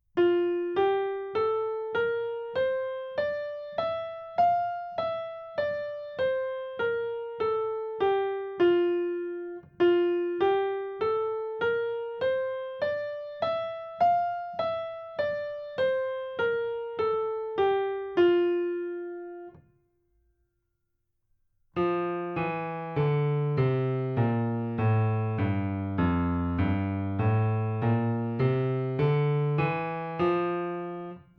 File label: F Major Scale Exercise